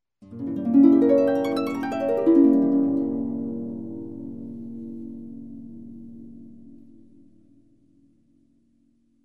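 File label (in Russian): мелодия на арфе вверх и вниз без паузы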